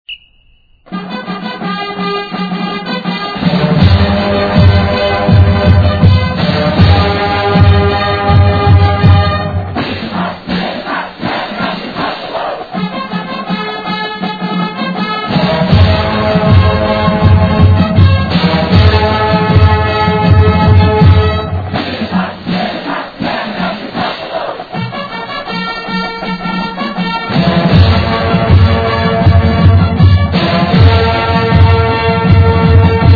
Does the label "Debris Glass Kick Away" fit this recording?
No